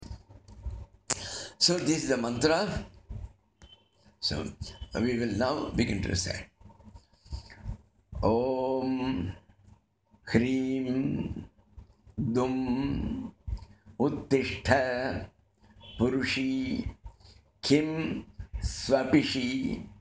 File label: DIGBANDHANA MAHA MANTRA